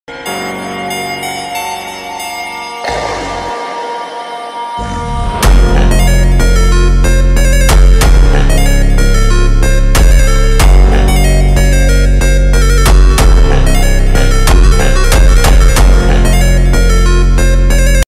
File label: super slowed bgm ringtone